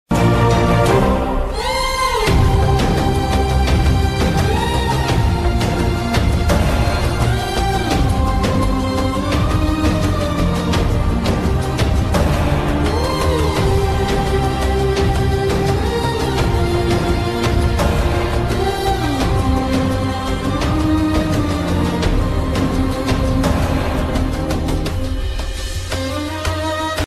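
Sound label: South background music ringtone tamil ringtone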